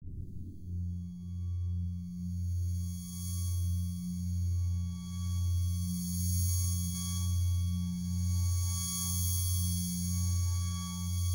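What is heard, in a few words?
space sound moon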